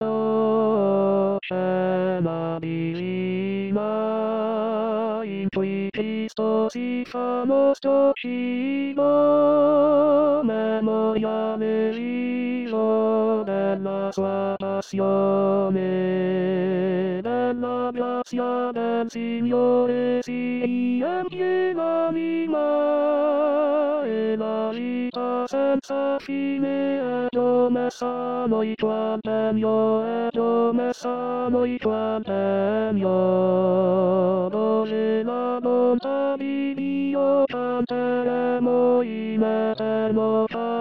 O Cena Divina Tenori

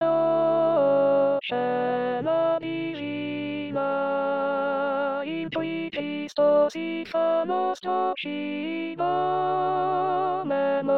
O Cena Divina Contralti

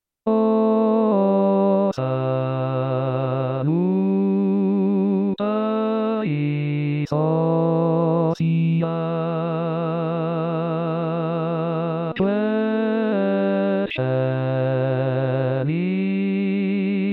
O Salutaris Hostia bassi; pasqua

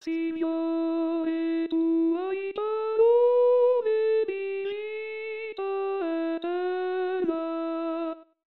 Signore Tu Hai Parole Coro; pasqua

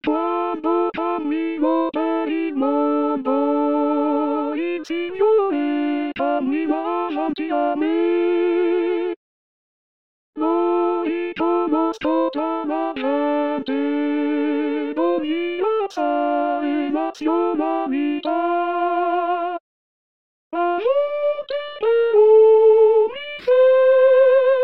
Voce

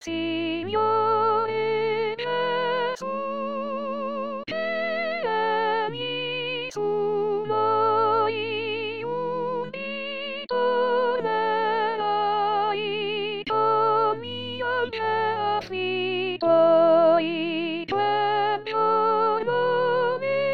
Soprani; Signore Gesu

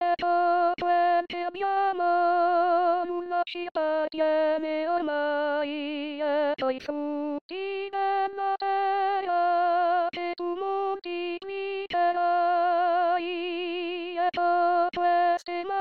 Ecco Quel Che Abbiamo 2v Coro

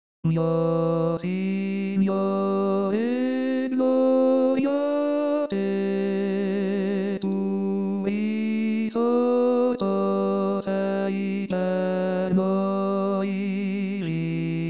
Tenori; Mio Signore Gloria a Te; Pasqua

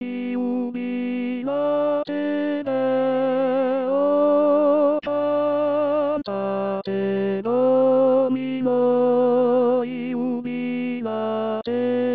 Jubilate Deo Tenori

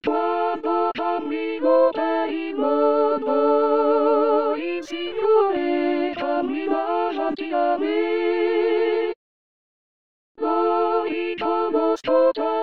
Coro

